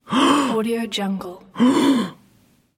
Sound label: young male surprised or scared gasp